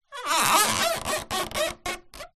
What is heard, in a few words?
office plastic squeak stretch adhesive tape